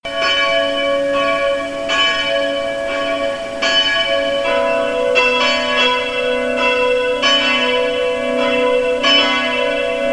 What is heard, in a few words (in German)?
Glockenläuten; Heil´ge Nacht auf Engelsschwingen; Adventskalender 2023